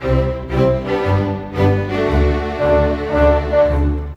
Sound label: Orchestra, Rock Pop; Sonic Foundry (Sony Creative Software); Orchestral Series VOL-4; Rock and Pop; Rock-Pop 03